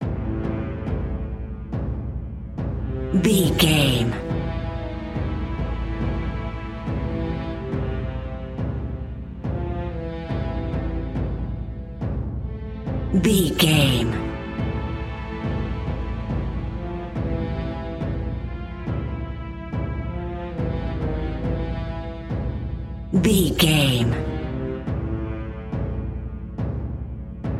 rpg pack boss

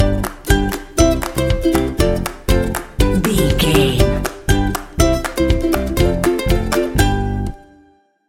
super happy fun stinger

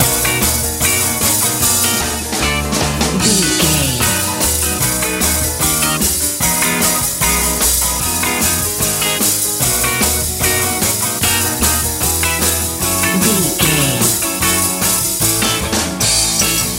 fun hot texas blues

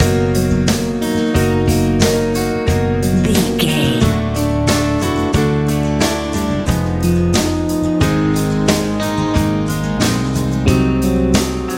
easy listening

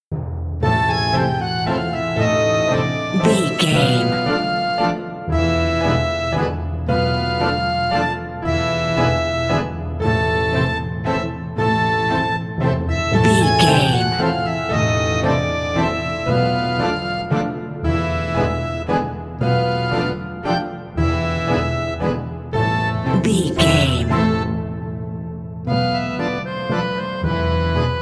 haunted carousel

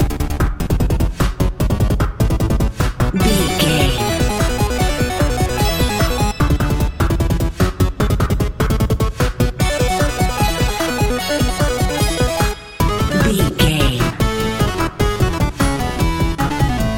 hero theme video game

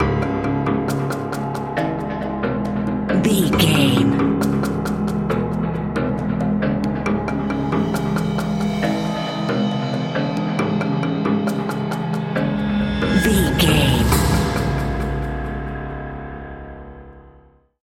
wilderness tension bumper

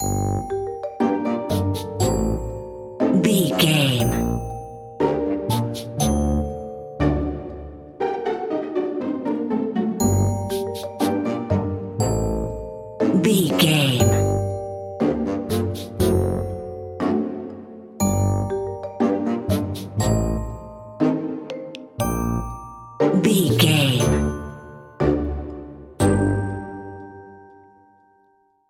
sneaky tiptoeing

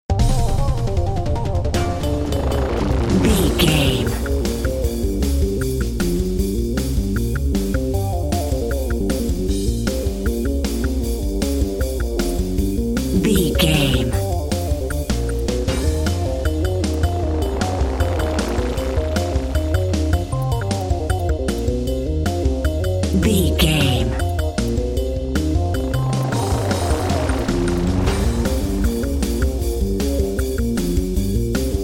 video game boss battle narrative

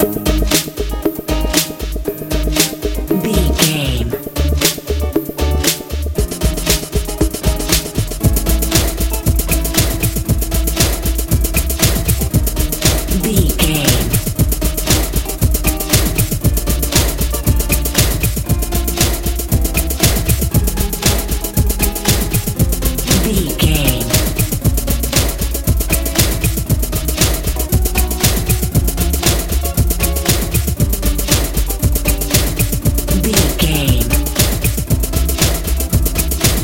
techno

